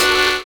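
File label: SAX N GUITAR; Univers Sons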